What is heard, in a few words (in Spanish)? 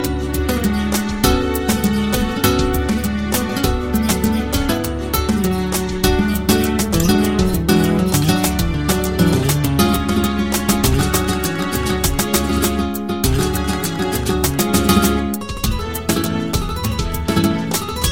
clasico guitarra castellano